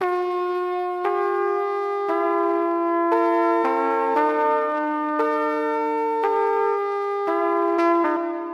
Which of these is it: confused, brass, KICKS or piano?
brass